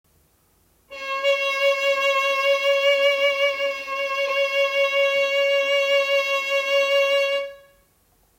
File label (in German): Vibrato Ton Cis Vibrato zu breit